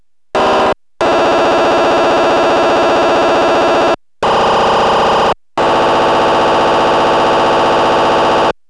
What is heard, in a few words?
remote keypresses 433950mhz